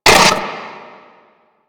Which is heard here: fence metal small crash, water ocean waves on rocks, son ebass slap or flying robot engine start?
flying robot engine start